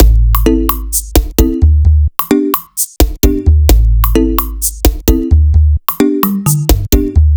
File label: BEAT CALMINHO